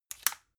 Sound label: magnetic plug; objekte